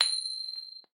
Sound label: coin